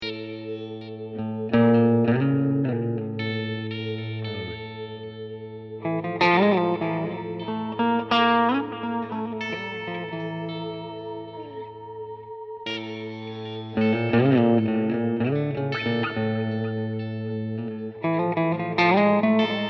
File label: raag